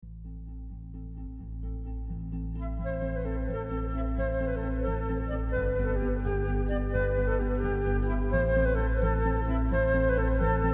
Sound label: March, Irish; Brian Borus March